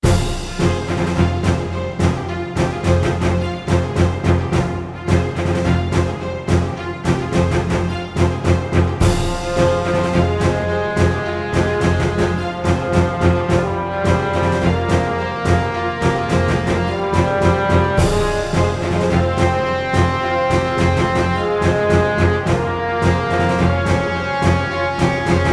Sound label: Menu Song; Army Men Air Tactics